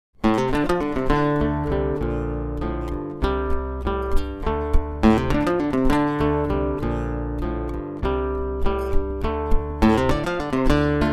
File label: guitar